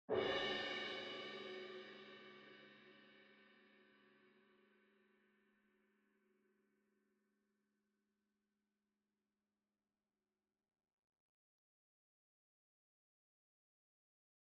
CYMBAL Crash Hit Soft Beater Far; boom library; Cinematic Strikes Construction Kit

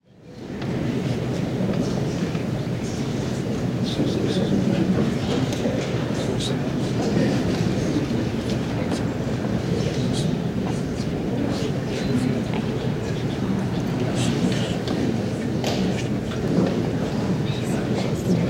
Church Hushed Crowd, Walla; Sound Ideas; Walla Series

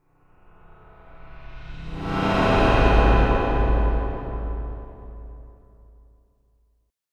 Metallic Whoosh - Appear Vanish; Hollywood Edge; sound designer's toolkit v2

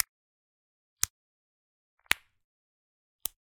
Generic Button Glass Tiny; boom library; The Interface

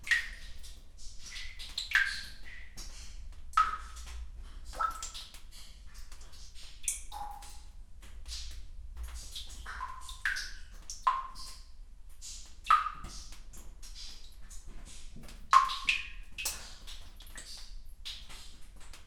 Urban underground water drips; urban and suburban; boom library

